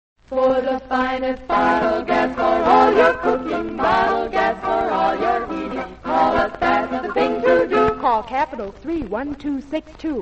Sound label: radio jingles; main gas; allied radio artists